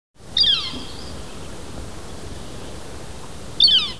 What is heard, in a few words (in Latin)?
Colaptes auratus; voice